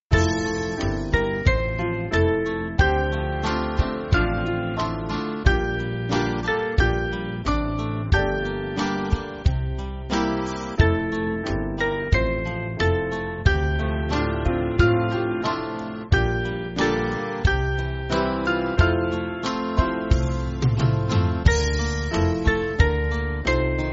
Band, Music Backing; Cranham; F-Gb

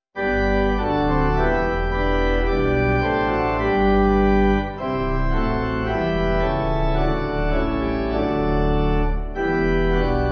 Pipe

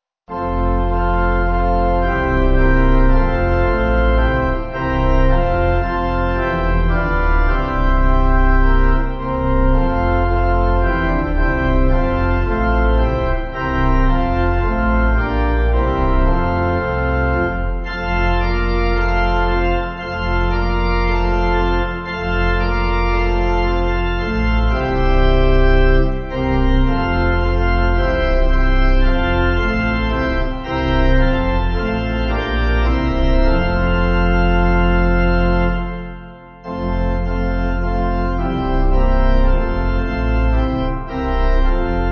Pipe, Pipe Organ; God sees the little sparrow fall, (Providence)

